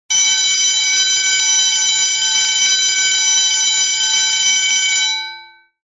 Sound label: Standard school bell